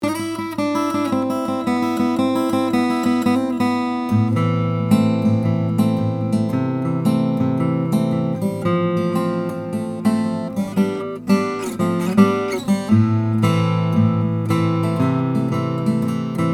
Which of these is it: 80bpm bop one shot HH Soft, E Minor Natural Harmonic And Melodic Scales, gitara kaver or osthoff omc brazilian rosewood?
osthoff omc brazilian rosewood